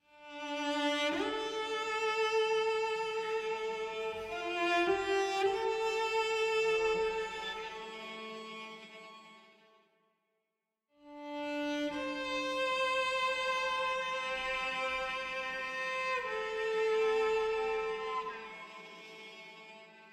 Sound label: cello no cadence; fatebinder; Tyranny Gold Edition